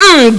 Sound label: MMM; L'Effet Pommier - Volume 04